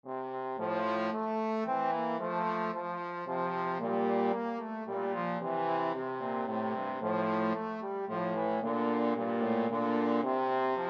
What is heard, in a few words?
ashgrove trombone duet